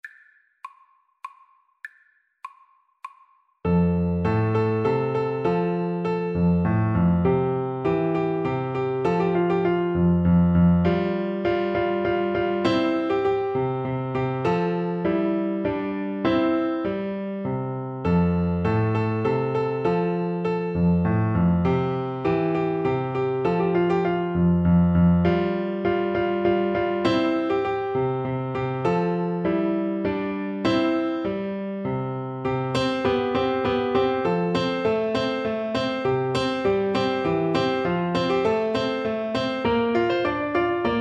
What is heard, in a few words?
Minuet, Tenor Saxophone